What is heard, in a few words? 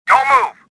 anger; worker3